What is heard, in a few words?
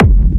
Techno Kick; Techno Kicks; Ghosthack